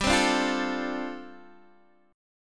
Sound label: G 7th Suspended 2nd Suspended 4th, Guitar DADGAD